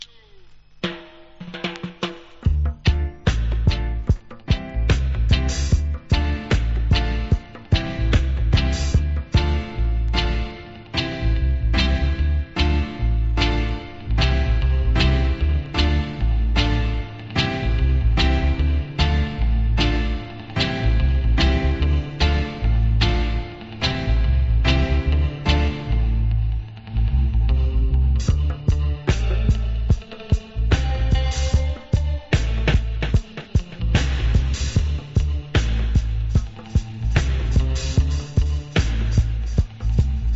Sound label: Roots Dub